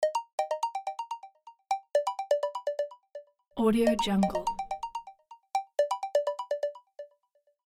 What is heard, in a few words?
incoming video chat call